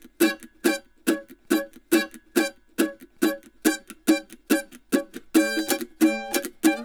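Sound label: Country Mandolin Pre Chorus; Digidesign; Pro Tools Essential Loops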